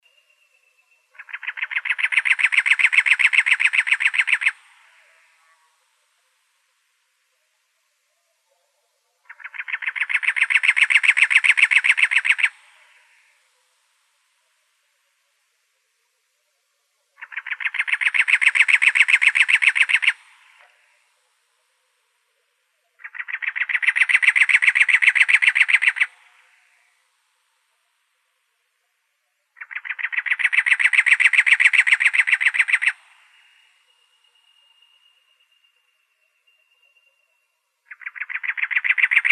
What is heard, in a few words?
Wrens Kinglets Gnatcatchers; CACW; deviche